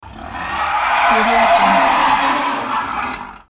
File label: monster scream